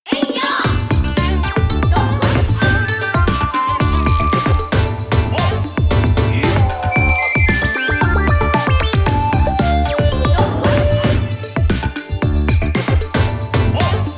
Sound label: bgm result